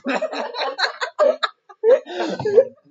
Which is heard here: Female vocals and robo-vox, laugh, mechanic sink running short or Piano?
laugh